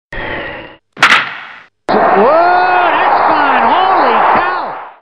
Baseball Hit Homerun

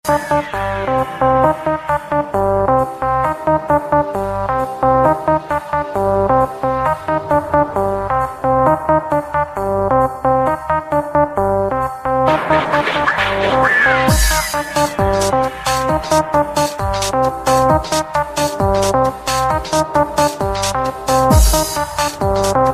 Cool Club Sound

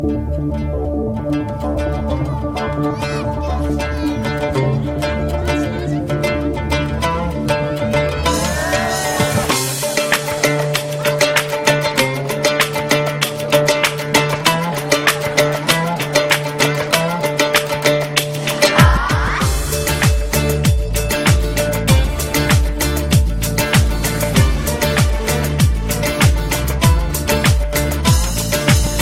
Nice Arabian Melody

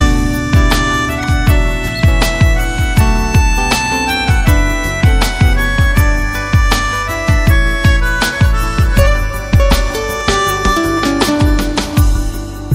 Sad Flute

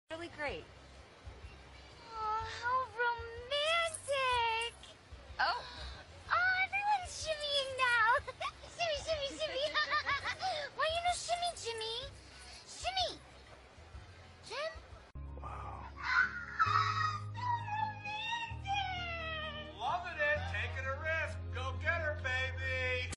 romantic

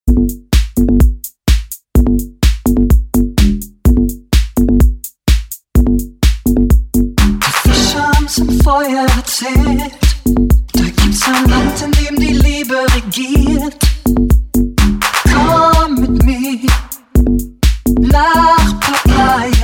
Nice Electro Beat

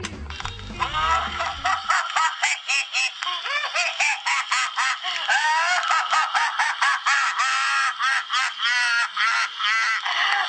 Frightening Laugh

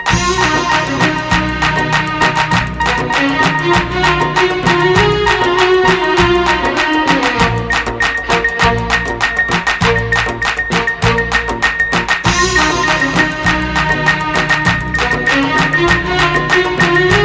classic arab music

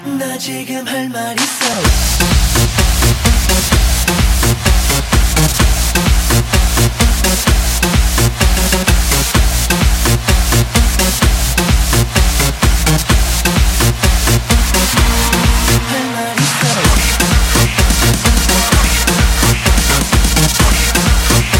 Bass

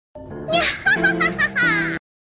Nyahahahaha

